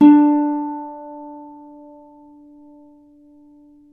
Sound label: Celtic Harp, Plucked Strings